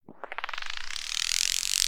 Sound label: Dragonfly Birth